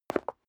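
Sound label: step leather shoes concrete single stair down, footsteps; schritte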